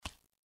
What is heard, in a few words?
Meat On Floor; granny chapter two; android; mobile